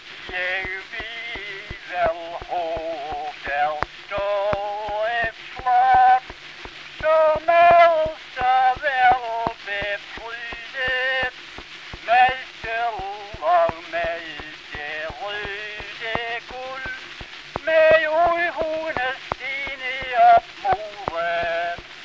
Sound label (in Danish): Folkesang; Dansk Lydhistorie Statsbiblioteket; Jeg ved vel hvor der stander et slot; 1907